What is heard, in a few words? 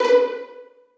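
2nd violins pizzicato a#4; Sonatina Symphonic Orchestra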